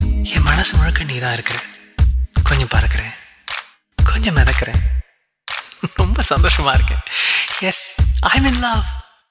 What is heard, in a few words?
nice ring tone tamil